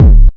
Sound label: KICK R; Big Beat Samples & More; AKAI